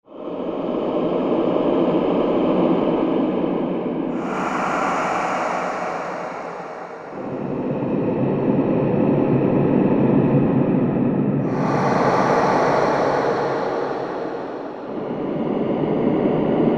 doom breath mix

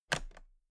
toolbox latches close regular; industrie wirtschaft; handwerk

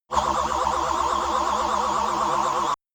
shot buzzing wavy tooth low; zaps buzzes

